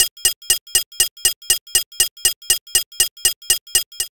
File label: retrofuturistic computer alarm; BluezoneCorp